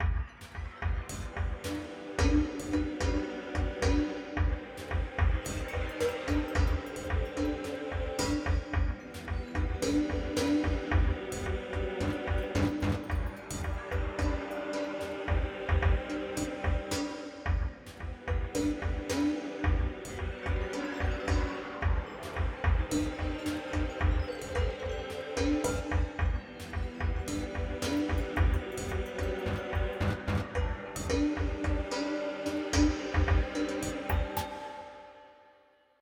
Creep Low

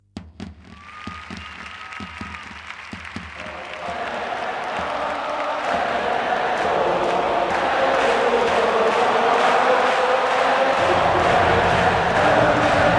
Chants, Spain

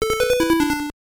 Item Collect; action 52; nes; Evil Empire

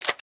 Akm Bolt back; criminality

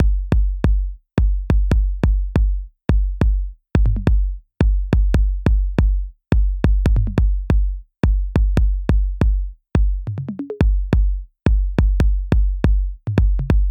Beat

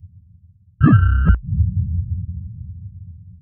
Scale Beat Block

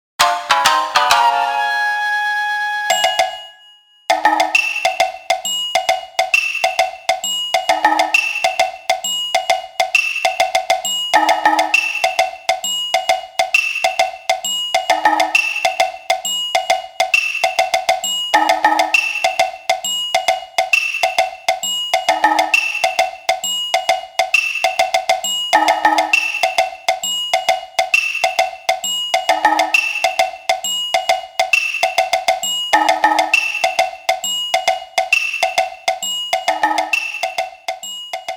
Defeat; fate hollow ataraxia

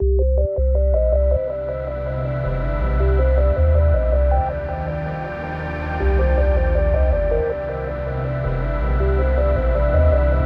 Space DnB

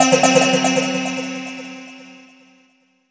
Incorrect; Puzzle Series Vol. 12 Akari

